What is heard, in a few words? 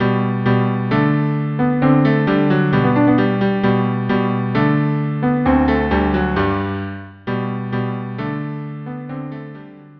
Allegro cello duo